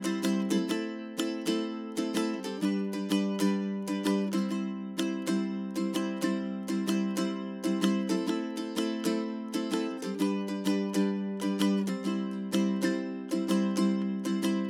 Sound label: Ukelele Mic